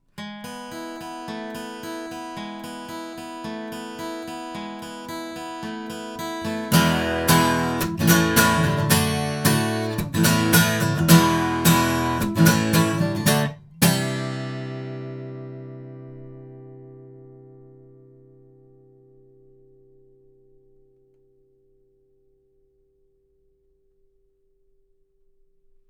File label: Rode NT2 omni guitar